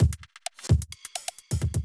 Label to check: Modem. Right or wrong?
Wrong